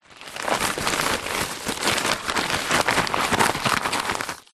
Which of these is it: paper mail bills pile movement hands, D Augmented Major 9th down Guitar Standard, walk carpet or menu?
paper mail bills pile movement hands